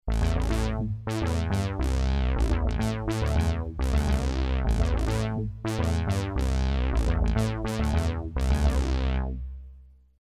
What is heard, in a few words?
poly, yamaha tg500; Vintage: poly synth